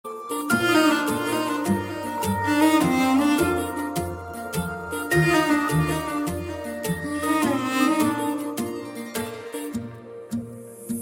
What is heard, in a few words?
Sms Cute Bgm